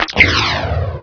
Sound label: pod binder activate